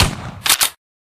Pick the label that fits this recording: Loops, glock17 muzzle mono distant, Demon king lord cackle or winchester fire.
winchester fire